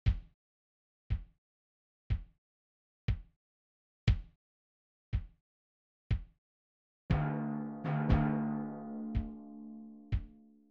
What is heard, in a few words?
Grave rhythms